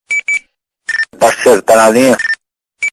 Radinho

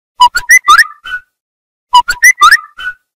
Assobio Whatsapp

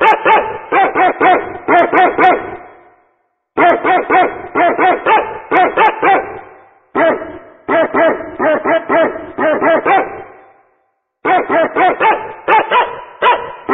Cachorro Latindo Remix

